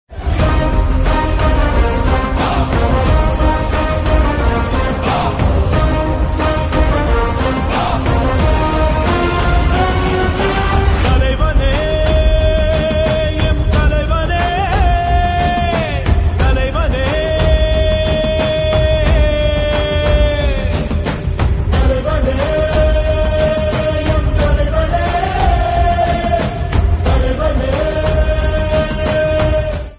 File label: Song bgm Ringtone; kanguva; siva